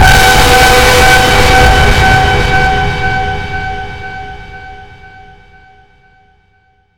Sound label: jumpscare sound; five nights at hanwils 2r